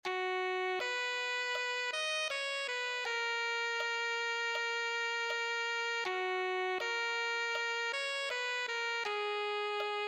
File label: alto saxophone